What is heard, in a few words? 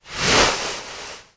household bean bag sat on; sound effects one